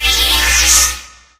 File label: turret scanner; Source Engine; Portal 2